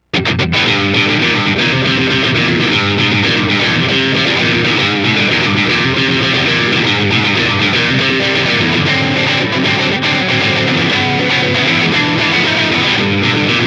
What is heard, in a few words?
RIFF